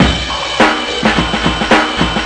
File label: 106 fluid shotgun ride, drum loops and breaks; 101 to 110 bpm